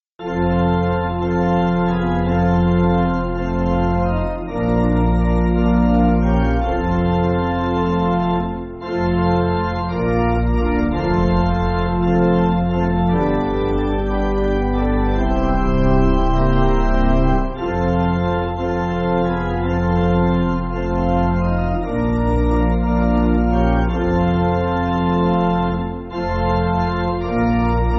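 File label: Pipe Organ, Backing Files for Churches; M.E. Upham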